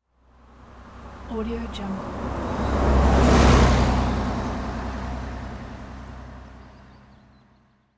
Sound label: old bus fast passby; fxprosound